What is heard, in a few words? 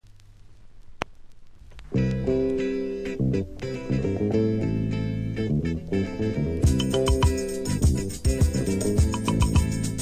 samba samba